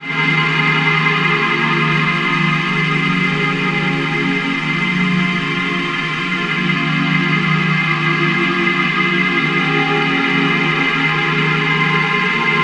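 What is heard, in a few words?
Floating Cloud Bb minor, Atmospheres; Zero-G Ambiosis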